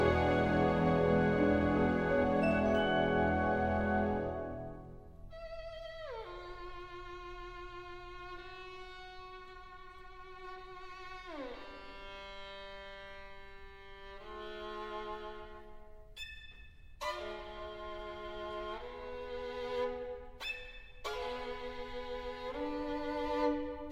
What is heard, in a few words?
Symphony Orchestra; Grofe Lorin Maazel Pittsburgh